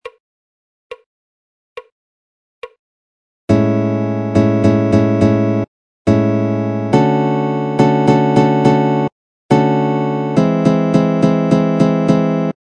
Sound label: example augmented chord progression, guitar